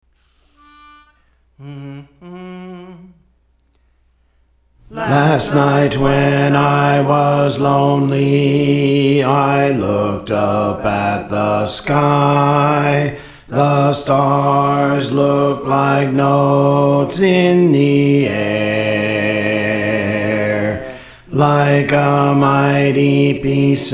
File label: Bass; Praise For The Lord Training